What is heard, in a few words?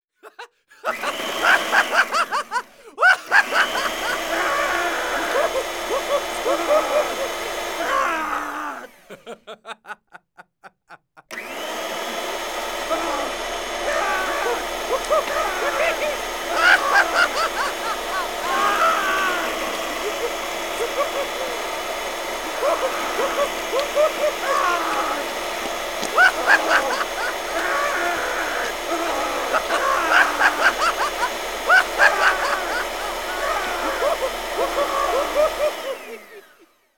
grint up man food prossesor; Cartoon - film effects